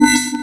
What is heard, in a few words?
timeout